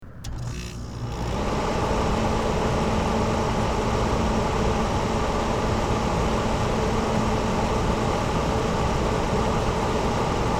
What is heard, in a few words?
Air Conditioner; Air Conditioner Exterior Perspective; Household; Air Conditioners